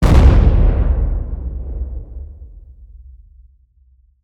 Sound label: ship explosion; weapons; Stellaris